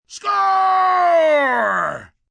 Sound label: Cheer Score, Cheering, male; Crowd; VoiceBox